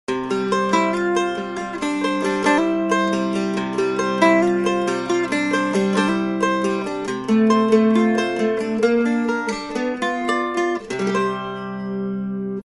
MANDOLA

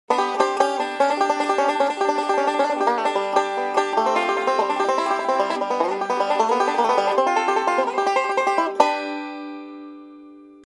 5st BANJO; instruments

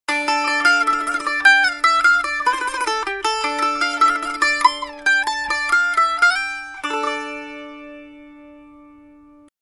BAGLAMA

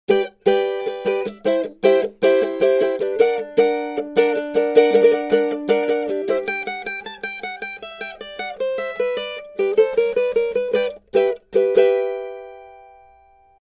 CAVAQUINHO

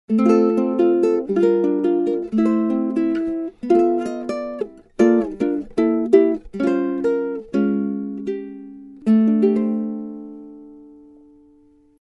TENOR UKULELE

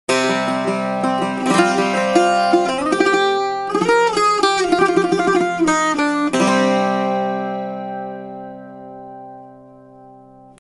BOUZOUKI

